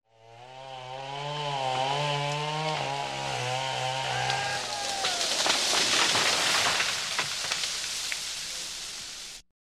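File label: chainsaw tree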